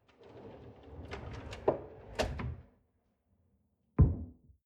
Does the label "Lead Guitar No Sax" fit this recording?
No